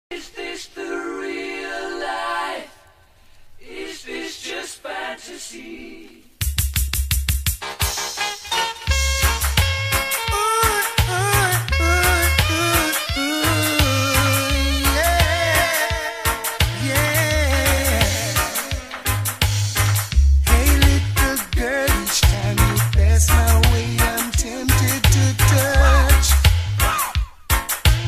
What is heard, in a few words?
90s old school reggae mix; trending reggae; get your groove on; caribbeanradioshow